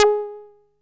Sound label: SOFT G#4; Best Service Alpha Dance vol.2; SOFT SEQ.2